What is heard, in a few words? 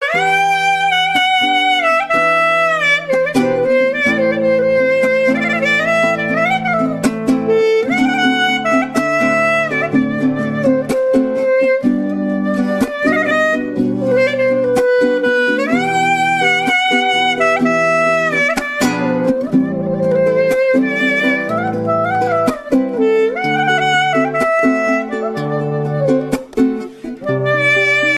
arabic, ringtones